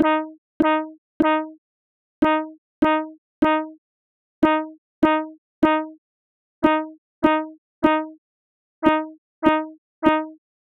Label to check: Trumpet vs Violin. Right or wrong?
Right